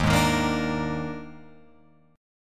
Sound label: D sharp Major 7th Suspended 4th, D sharp, down, Guitar, Open F; strummed; D#M7sus4 chord; JGuitar